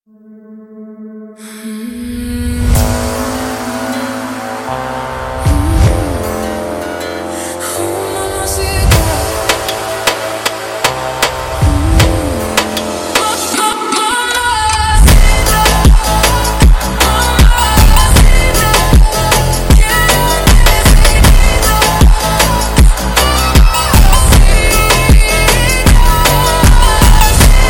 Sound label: Funk super Slowed